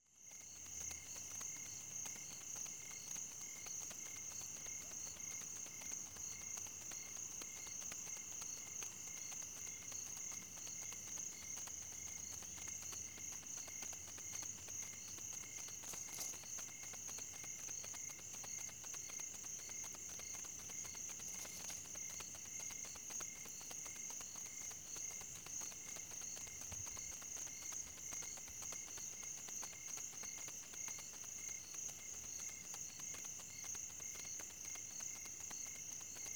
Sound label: African Bush; Animals & Birds; The BBC Sound Effects Library